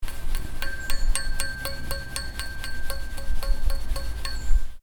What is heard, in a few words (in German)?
Laterneklopfen